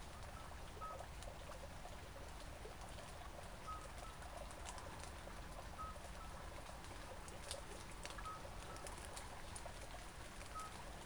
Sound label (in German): Geburtshelferkroetenrufe bei Regen